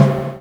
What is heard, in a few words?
TOM TOM; E-Lab; X Static Goldmine 2; akai